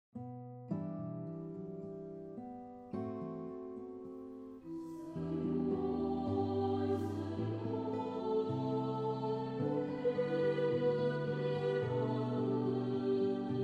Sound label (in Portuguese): Senhor tende piedade de nos 1 voz